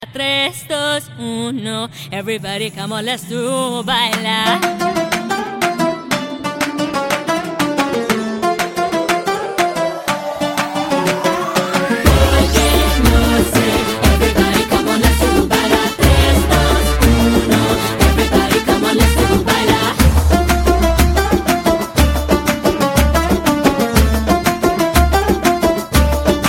spanish guitar remix

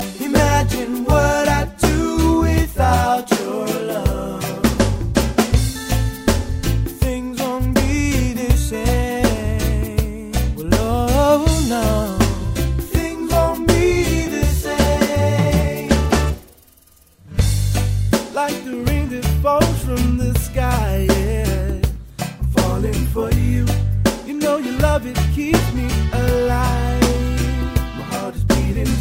reggae

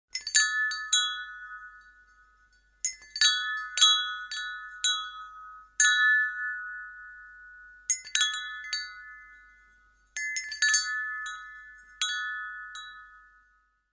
wind chimes

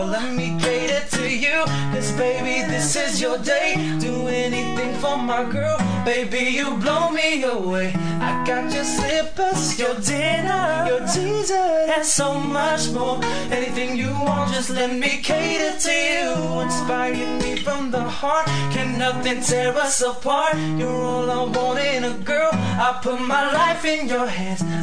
acoustic

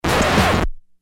record scratch

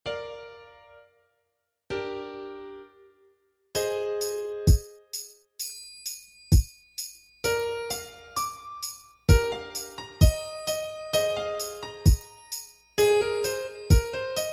piano

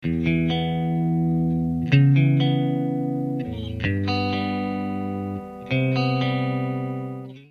nice riff guitar

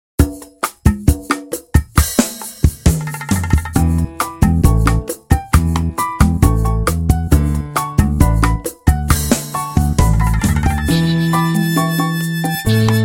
joy to the world instrumental

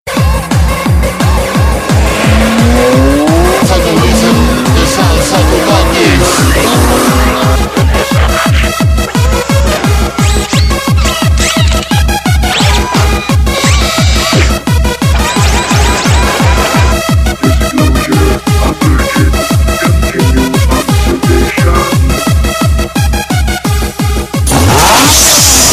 techno trance

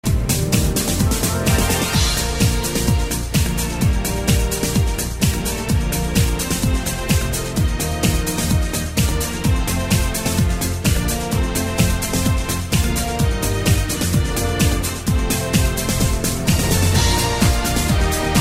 theme techno mix; Jurassic Park

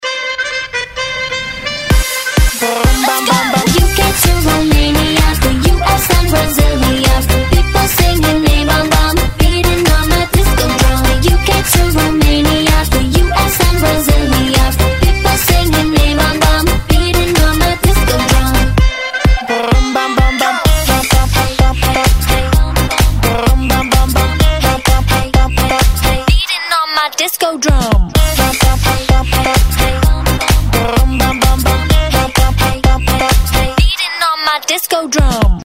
disco drum; ringtone

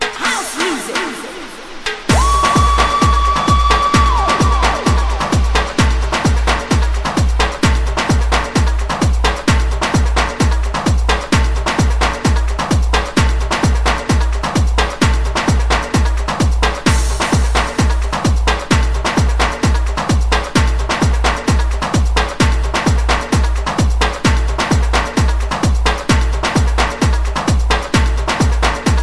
house music

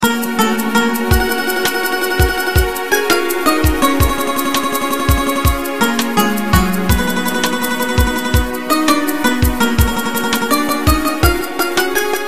guitar

